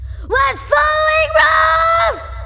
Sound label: fall; quake2; buttercup